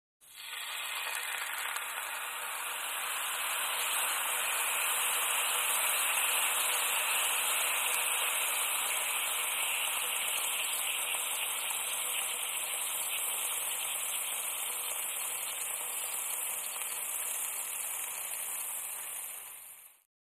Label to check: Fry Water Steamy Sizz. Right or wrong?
Right